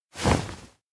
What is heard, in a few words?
point; aino; anemoi